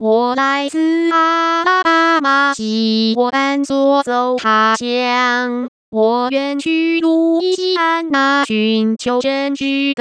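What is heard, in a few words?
synthetic singing voice, 女聲, original; O! Susanna; (噢! 蘇珊娜); 使用頻譜包絡內插之國語歌聲合成 (Mandarin Singing Voice Synthesis Using Spectral Envelope Interpolation)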